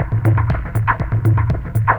120 Dance E-Perc; Pro Tools Essential Loops; Dance DJ; Percussion

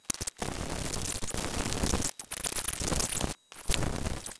vocals

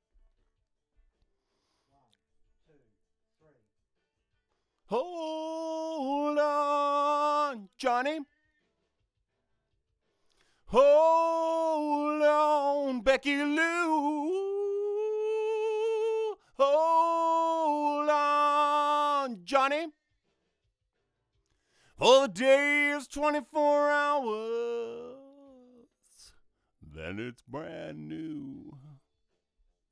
Vox

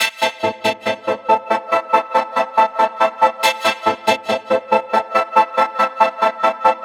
140 House Arp Amin; Dance; DJ; Synth; Arps; Pro Tools Essential Loops; Digidesign

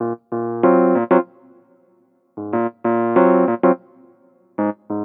095 NuJazz MarkII Bbmaj; Piano; E-Piano; Pro Tools Essential Loops; Digidesign

